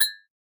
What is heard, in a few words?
Cartoon Blink